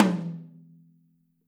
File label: Drums Percussion, OH Mic